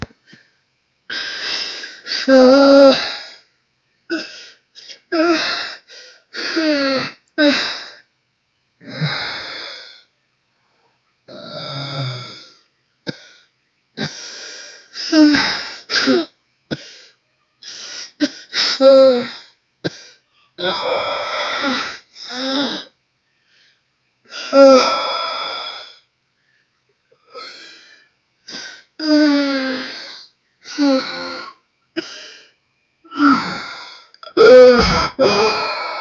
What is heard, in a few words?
18 years old man holding, close mouth